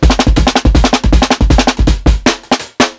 PICCOLO 80 32D FILL, DRUMS LOOPS; BASEMENT DRUMS VOL 2

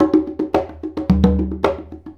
Congas; Essential Percussion

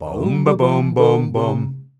BAUMBABUM, MALES, Hyper Dance; Best Service

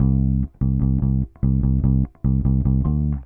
Bass, Indie Rock

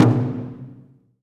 SMALL DRUM, JAPANESE

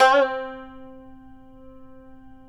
tar; Roland - LA Composer vol. 3

